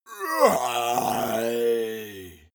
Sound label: monster growl aggressive; kreaturen